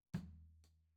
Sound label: Tom